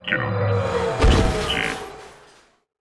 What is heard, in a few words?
die; ACCRETIA